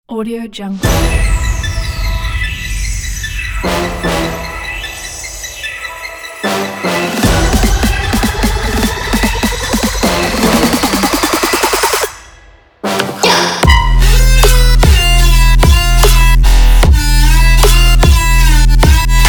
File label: agressive hard trap